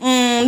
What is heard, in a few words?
"mmm" female, Voice